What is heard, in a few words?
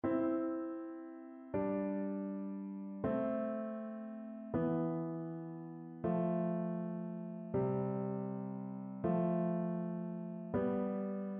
canon, piano; pachelbel